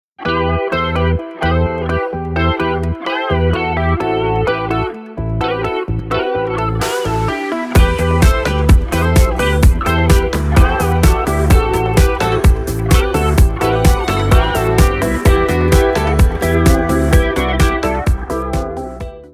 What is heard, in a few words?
Ring